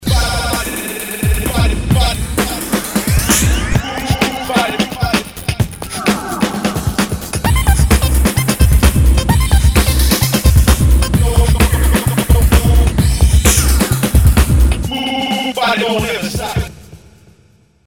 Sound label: Techno, Samsung SGH-Z130